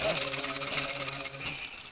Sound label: RATTLE